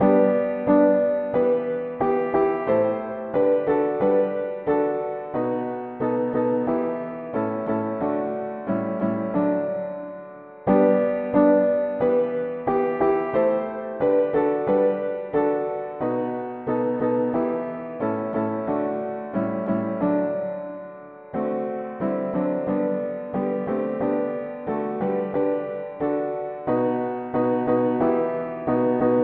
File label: piano; hymns